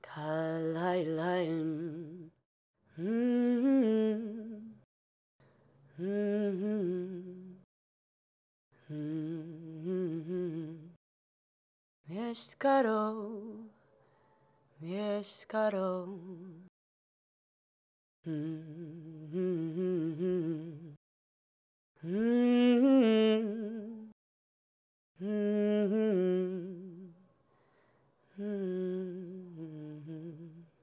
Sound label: hum loop